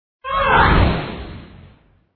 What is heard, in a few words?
black widow death